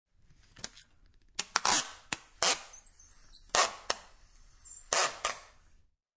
Scotch Tape Rip, Scotch tape being ripped from the spool; Offices